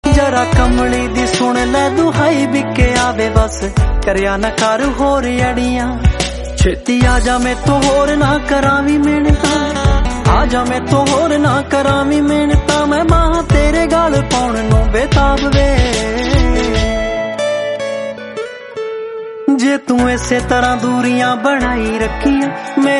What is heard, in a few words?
New Punjabi Song, ringtone